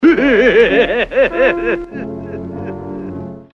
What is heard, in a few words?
tomlaugh; svencoop; FZSaySound